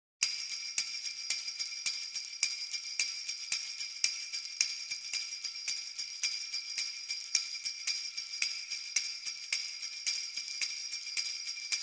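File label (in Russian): рождественские бубенчики ритм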